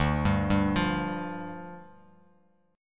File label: D-Minor Double Flat 5th, D, Bass Standard; 10,10,5,10; Dmbb5 arpeggiated